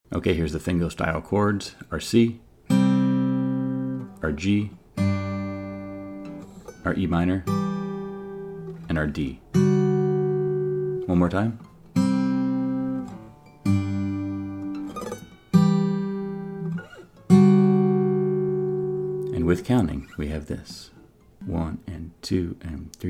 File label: adding fingerstyle